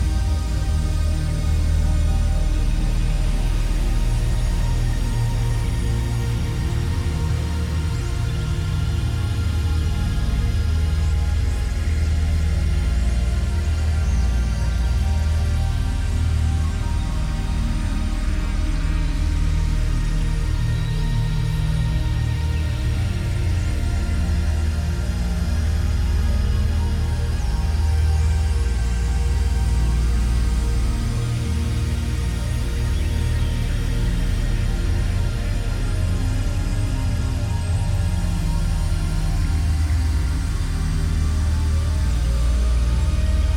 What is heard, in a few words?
song